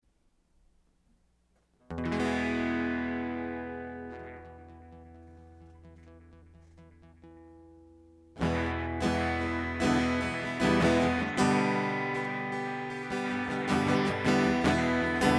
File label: The Genie is Out of the Bottle Instrumental; hyper terrestrial